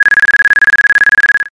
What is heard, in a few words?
trainbell